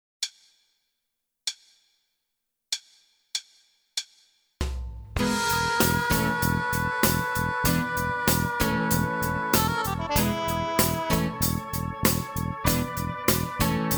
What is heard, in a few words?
Pattern Rock In 1/8